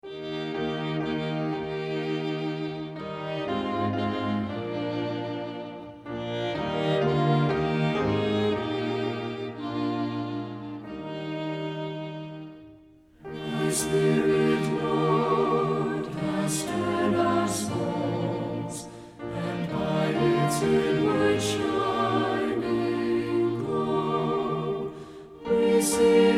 thy spirit lord has stirred our souls words and music